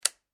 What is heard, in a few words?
household switch light flick; Light Switch ( Wall Mounted ) Single Click; Light Switch Click 8; McKinney Sound